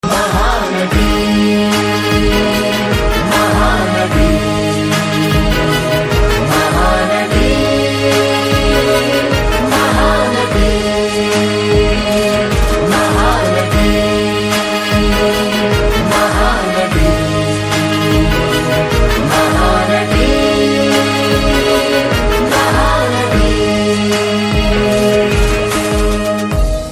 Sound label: BG Tone; Mahanati